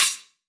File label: Open Hihat; Dubstep; Drums; One Shots; Cymbals; Hihats